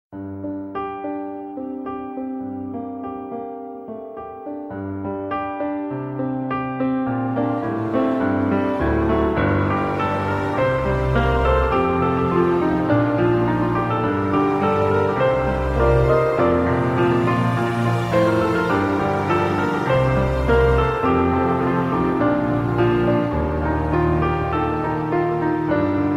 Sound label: guitar